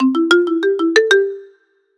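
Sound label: Telephone, ringeklokke; Ringeklokke med høy kvalitet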